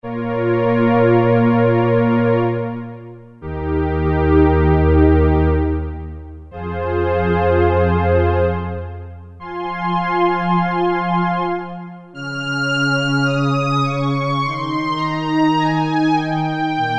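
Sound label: Rich Strings, Roland MKS-50; Factory examples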